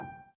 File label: Piano Chord